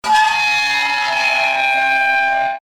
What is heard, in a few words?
destructive metal scrapes